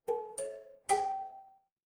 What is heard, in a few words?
office elevator